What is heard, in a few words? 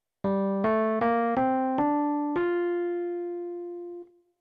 scale, piano